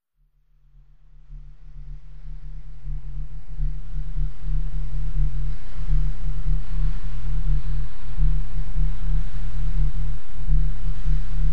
Low Airy Sub with Haunting Vocals

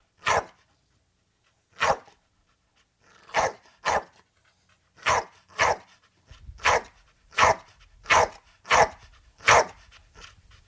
Pit Bull Barking Norm

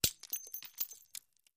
Glass Impacts Debris; windows & mirrors

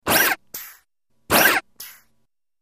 Double Servo Rev Up, servos

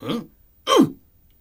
Grunt Male Single

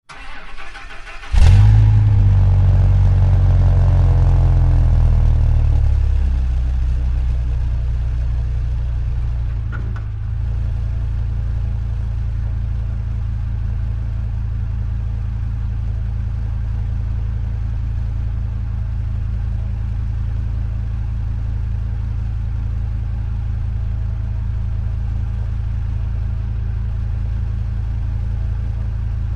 Dodge Ram Start Idle

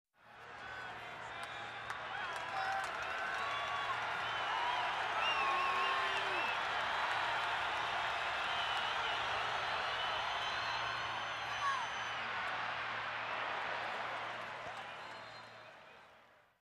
Football Crowd Exci, sports arena football

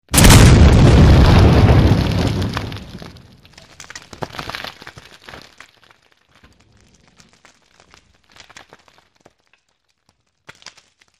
Bunker Explosion

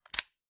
Electric drill being turned on and off.; Tools, Hand Drill Motor, Drill

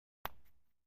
Golf Putter Putts Ba

